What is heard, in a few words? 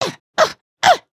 shovel; emotes